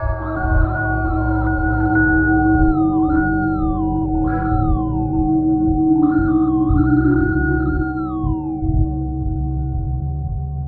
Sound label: bell